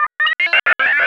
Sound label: sphere blips lg; npc; Portal 2